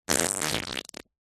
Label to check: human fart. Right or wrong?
Right